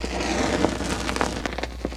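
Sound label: Torch Whoosh Crackle; torches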